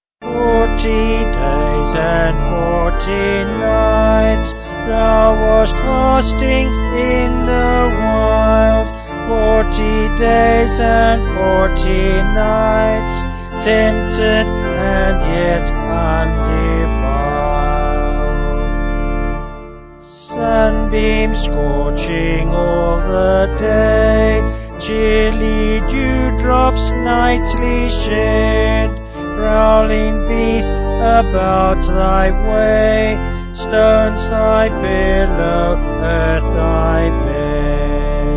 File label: Vocals Organ; Aus Der Tiefe